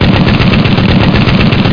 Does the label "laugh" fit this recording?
No